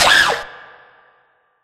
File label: cry